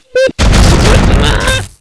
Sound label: pain; Alien Arena; droideka